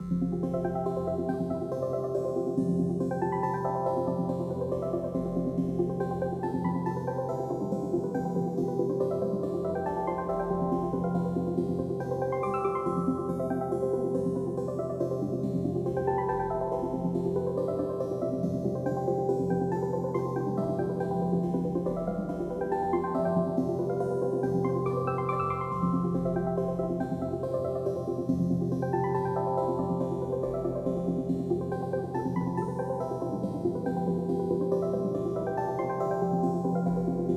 Drones; Hohokum; PS Vita

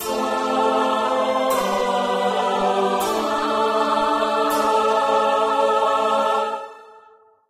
gameover; Tears Revolude

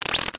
grow; Builder

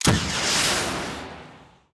tools launcher; teardown; ps5 windows xbox series xs

